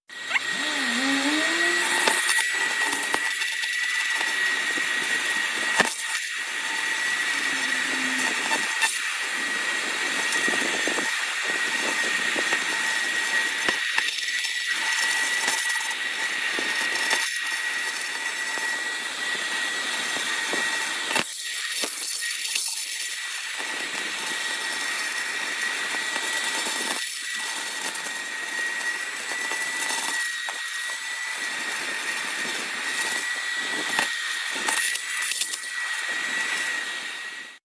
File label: laser rust removal